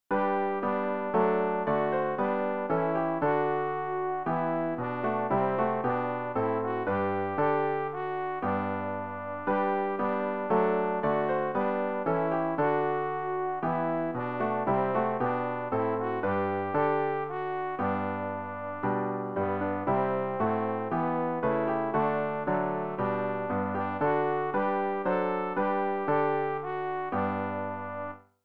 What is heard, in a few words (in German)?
alt, Übehilfen für das Erlernen von Liedern; ref gesangbuch; rg 174 liebster jesu wir sind hier deinem worte